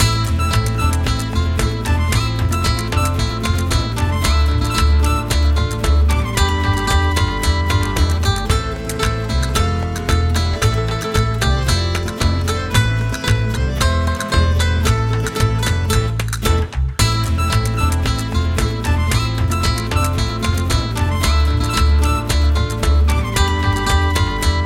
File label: Tapas del Mar (Win); Cooking Dash